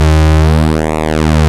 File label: PULSE MOD D1, ARP Synths, Analog Odyssey; E-MU Formula 4000 Series Vol. 3; ARP Synths II